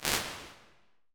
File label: Echo Place; Medium Spaces; Apple